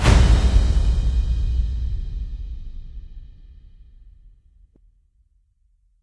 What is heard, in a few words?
Combat Transition